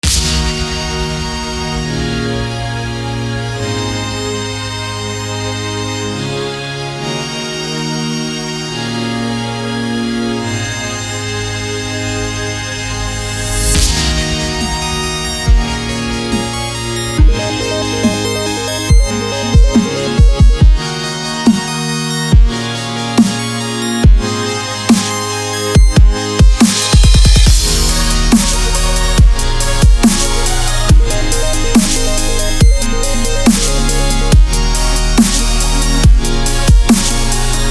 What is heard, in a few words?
Instrumental, EDM 140BPM; EDM Rainbow Dash